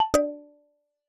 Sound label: classic quiet, message received sounds; Session iOS